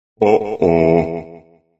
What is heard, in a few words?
oh oh ringtone, sms ringtones